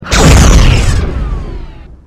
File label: You Hit; Combat; Cosmic Rage; The Experience-light; ships